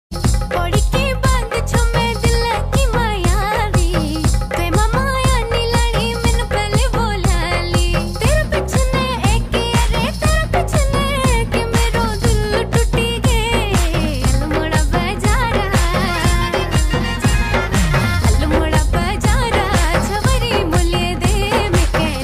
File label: uttrakhandi ringtone